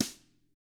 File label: DW 1 BR, Double Platinum Drums, BRUSH; ILIO; DW+DW